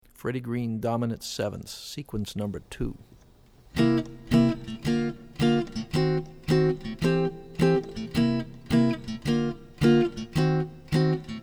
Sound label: D mnt 7ths Seq; jazz chording